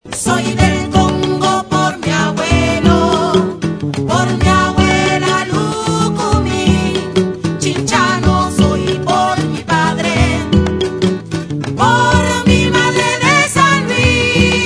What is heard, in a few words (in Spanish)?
tumba; Tumba y cajón